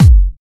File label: Soft Kicks; Vengeance Samples